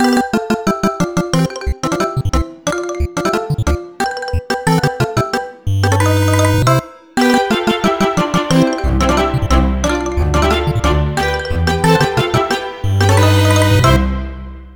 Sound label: Goal